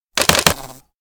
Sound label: insect fly; anemoi